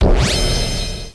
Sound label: Curse Attack; Knight Online